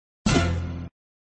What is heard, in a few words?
Sound Tusch; rolling over light on PoA